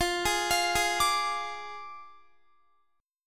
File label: F Minor 6th F down Guitar; arpeggiated; tuning 4F,4A,5C,5G,5C,6E